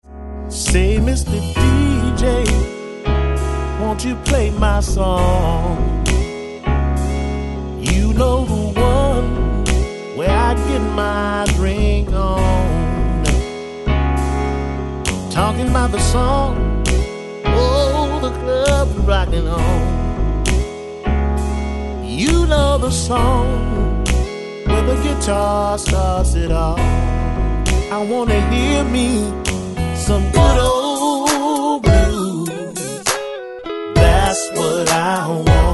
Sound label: Blues; Old School Blues